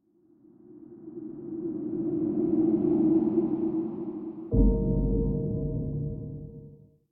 雾气转场

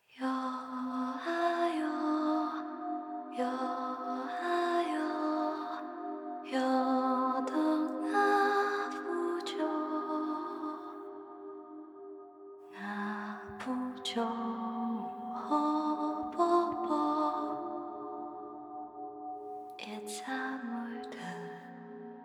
人声

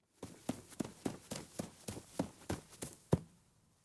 小孩从远到近跑步

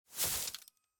装上插销; 八音盒

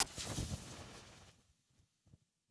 煤油灯点燃成功

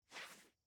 物品查看, UI

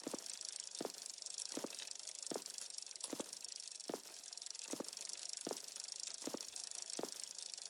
拉黄包车 loop

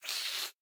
孤儿院走廊 猫咪嘶吼

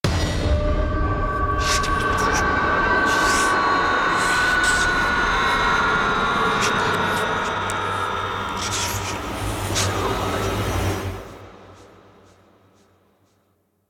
公寓楼道 鬼差偷看

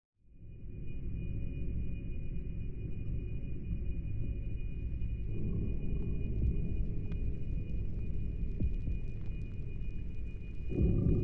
心跳背景音

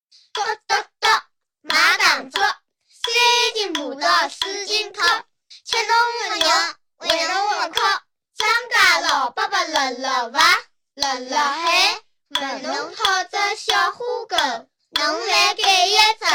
童谣

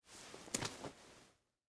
场景转场

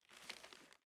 打开纸张

